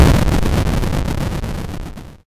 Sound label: boss explode; fife-parpg; shooter